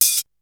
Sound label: Open hat; ZERO G - Datafile DISC 3